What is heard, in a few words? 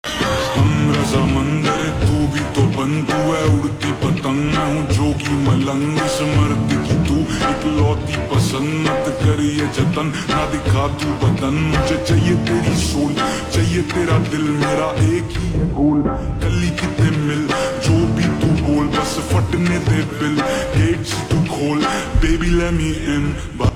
Ringtone Slowed Reverb